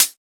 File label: Closed Hat